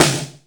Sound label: heavy s, Yamaha RX-5